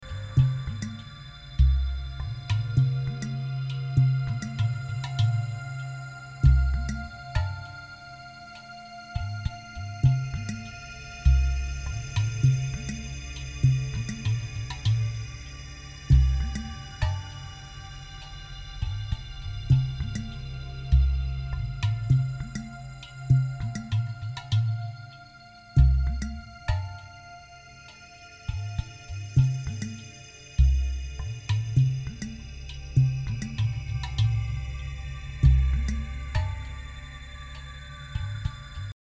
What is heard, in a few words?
song before after clean then warm